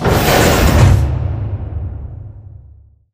sliding door lg verb open slide; beacon; Titanfall 2

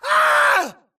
grunt death crush; Titanfall 2

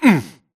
grunt hits; Titanfall 2

